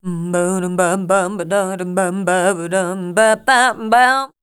RHY LINE, Voice; Best Service; Voice Spectral II

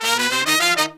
Pop & Funk Brass, 096; Best Service ProSamples vol.25; AKAI

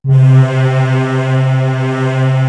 DEEP VX, 01W CHURCH; East West; Global Sounds

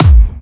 Clubby Kicks, Bassdrums

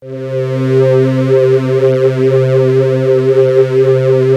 flute C, LIQUID FLUTE; Best Service ProSamples vol.55 - Retro Sampler; CASIO FZ-1; Liquid Flute Pad Mod

